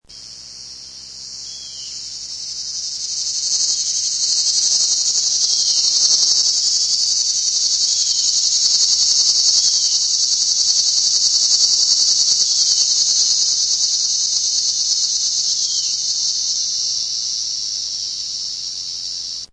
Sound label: Cicada linneib